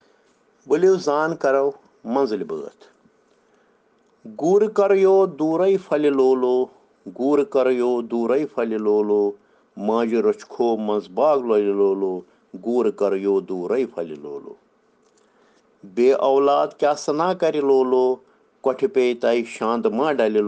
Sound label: Children Rhyms in Kashmiri